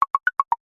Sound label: multimedia notification subtle ui clicks pattern